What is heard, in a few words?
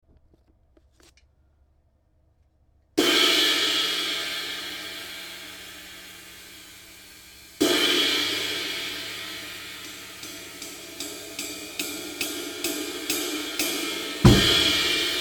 22 A 2495 gr; 50s LS; Lg Stamp